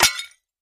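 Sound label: Tile Large Square Break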